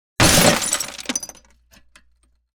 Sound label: glass